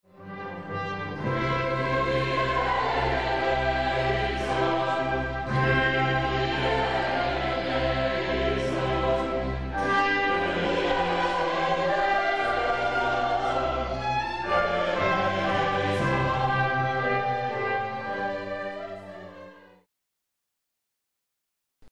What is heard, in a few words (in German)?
Junger Chor und Pfarrorchester Mozart Kyrie